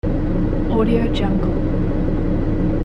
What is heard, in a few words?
car motor running loop